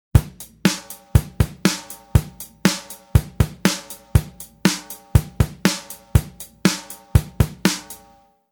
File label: drum 8beat; ドラムの基本といえば、8ビートと16ビート。ドラム初心者が最初に教わるドラムパターンです。